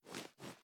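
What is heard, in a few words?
assault rifle reload empty reach; viper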